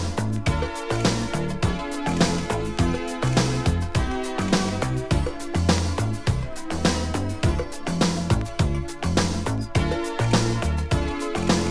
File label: INSTRUMENTAL